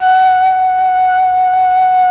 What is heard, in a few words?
FLUT1; ILIO; Propeller Island Legendary M400